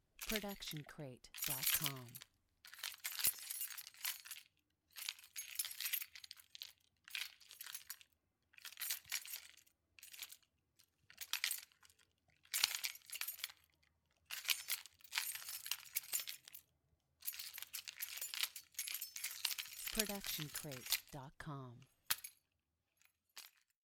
jangling keys various; soundscrate

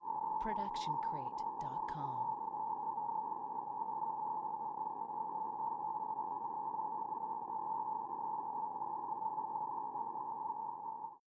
icy wind; soundscrate

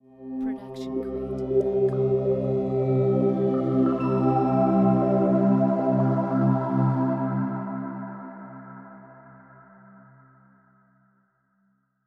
Science Synth Pad Ident; SoundsCrate

